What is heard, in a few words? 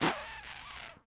Mushroom Turret Spit